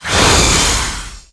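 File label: snake poison attack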